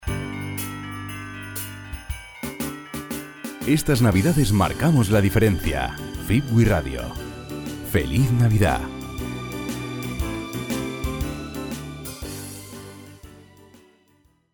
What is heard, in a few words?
jingle radio; fibwi radio